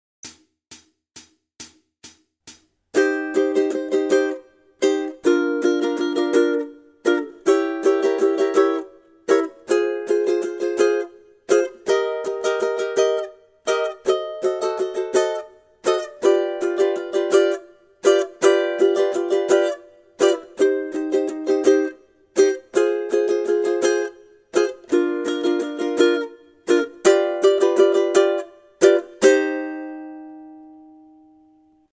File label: rhythm study, ukulele; grade4